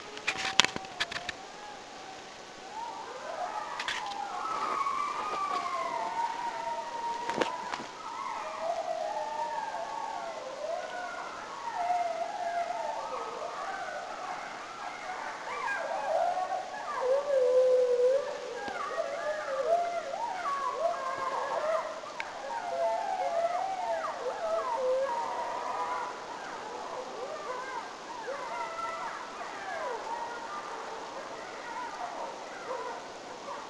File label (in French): adultes et jeunes, loup; Le loup; cris divers; telegraphe 2006; Florac